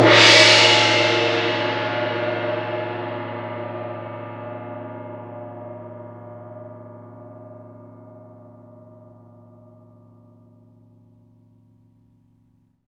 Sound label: CYM LRG, Gongs Ambo; Roland LCDP03 Orchestral Perc